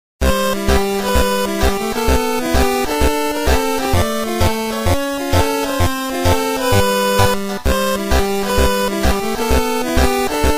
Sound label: Music Room Theme; G&WG4